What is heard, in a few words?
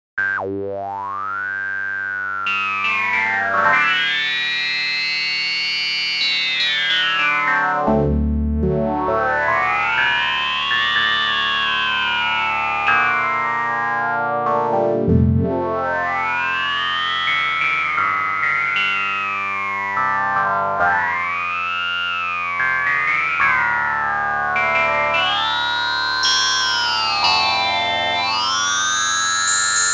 ResoWave